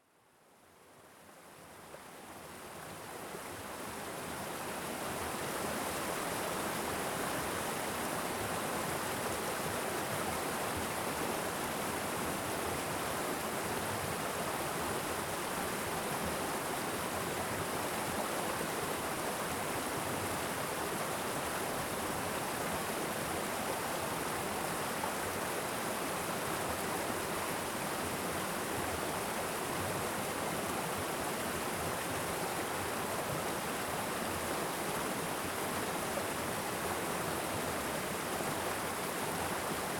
Small Waterfall, Close, Constant, White Noise, Bubbling, Creek, Ambisonic, Vermont; Immersive Creek; RODE NTSF1 AmbiX; Bolt; Undisturbed Creeks in Vermont; GDC 2024; Game Audio Bundle